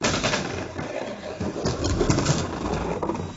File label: gutter; Gutterball 3